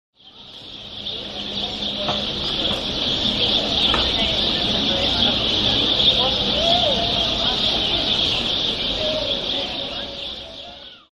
calles peatonal gorriones trinando, rosario; san martin

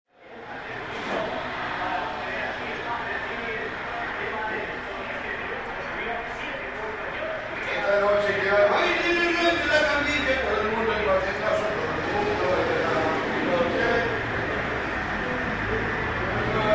bares bar; av segui y felipe more; bar del chirola

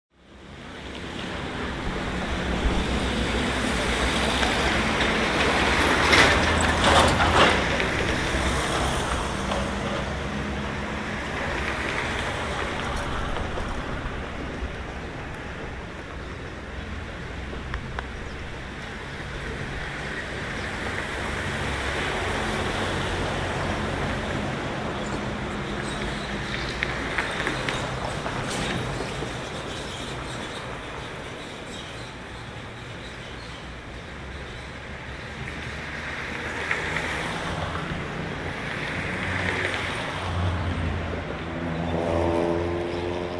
calles; flamarion y av uriburu 1210 hs 22 ene 2007; Rosario

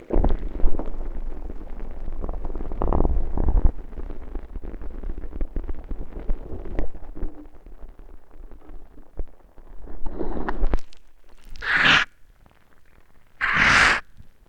toma mate

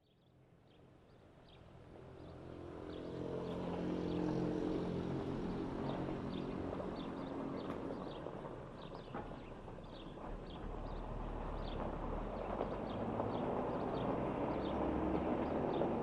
campanas; entre rios; colon

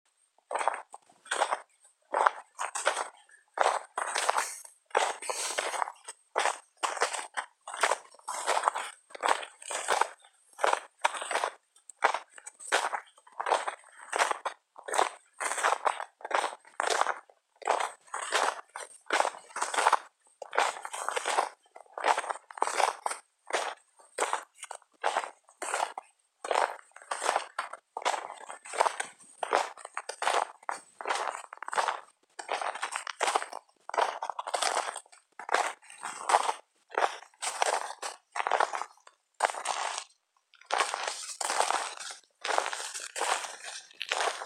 salinas grandes, cordoba; san jose de las salinas

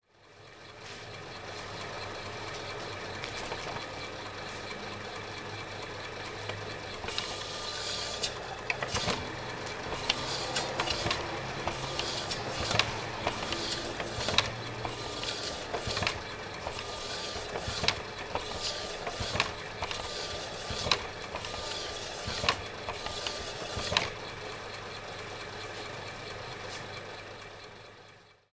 despensa, Arroyo Seco; luna nueva

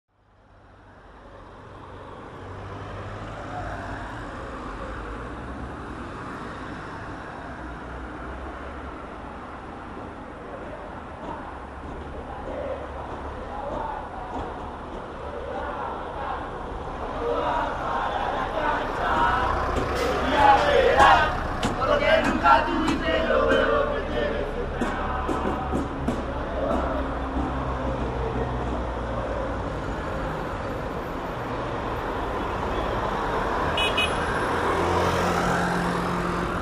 calles hinchas cantando linea 103; catamarca y crespo; Rosario

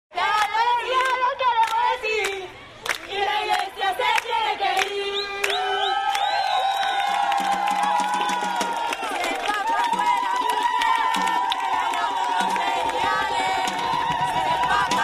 actos marcha 1er encuentro nacional lesbianas y bisexuales; Rosario

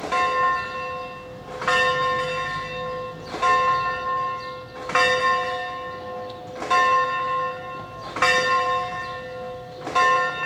parroquia campanas, rosario; ntra sra de la rocca

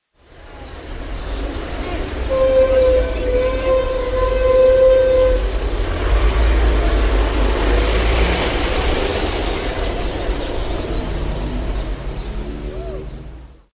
calles rosario; san luis y corrientes

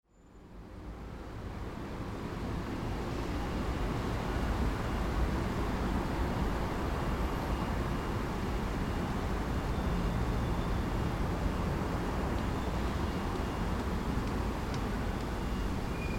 plazas campanas catedral; sicilia

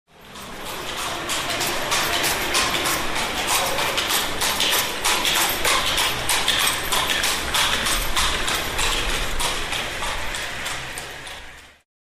calles carro pasando; rosario; jujuy y corrientes

